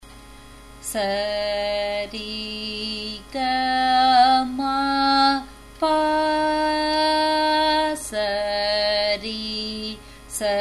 Sarali Varise G sharp, music; Carnatic Music

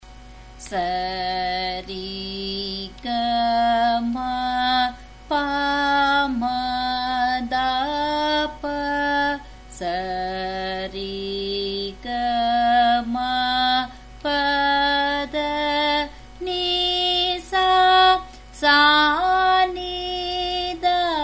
Sarali Varise, F sharp

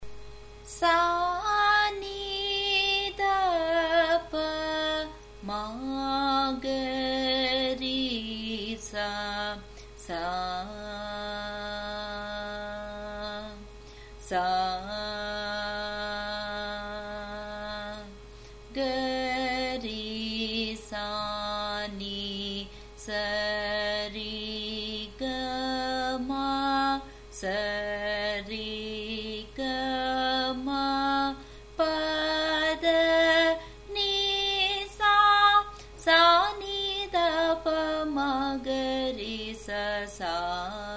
Mandhra Sthayi G sharp, music; Carnatic Music; Mandhra Sthayi Varisai in 5.5 kattai (G#, G-sharp)

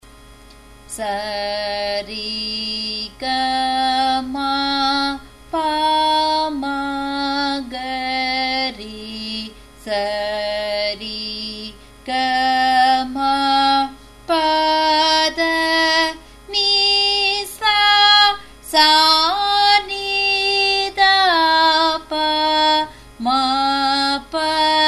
Sarali Varise G sharp, music; Carnatic Music

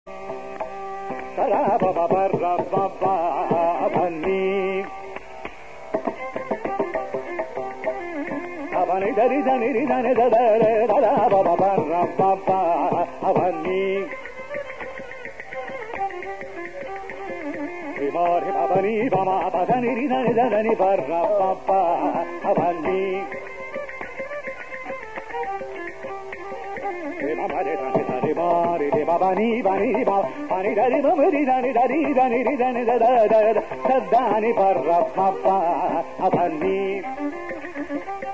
atana swarakalpana; manodharma; paramapavani

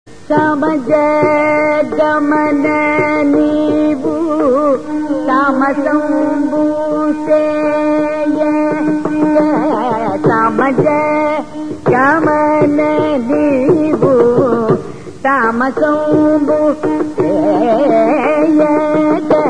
dhanyasi neraval; manodharma; meenalochana

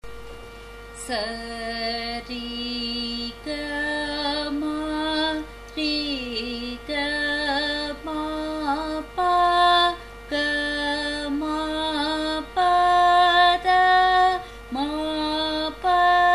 Eka Talam, A sharp

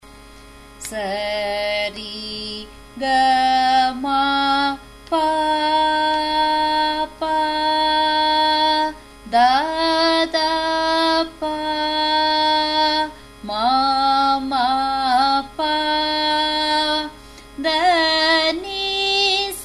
Sarali Varise G sharp, music; Carnatic Music

